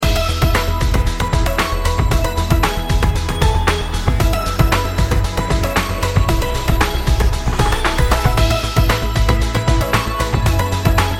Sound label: Free Mode BGM